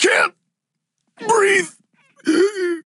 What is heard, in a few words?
death; Paladins; Buck